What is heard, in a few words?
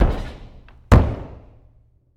Boxing Ring Bodyfall